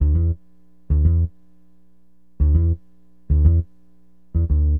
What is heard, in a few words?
BASS, Acid Jazz Funk